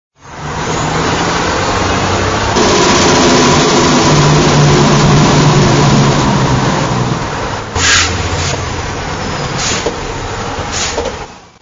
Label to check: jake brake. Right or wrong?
Right